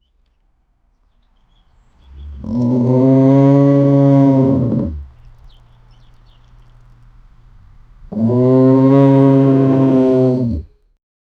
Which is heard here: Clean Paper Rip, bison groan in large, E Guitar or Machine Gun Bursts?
bison groan in large